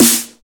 Dubstep Snare

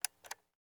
Marantz PDM201 Unpause Unpowered

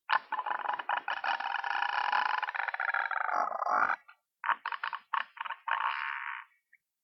Alien Noise

